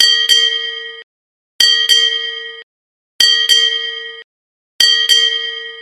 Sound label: interval bell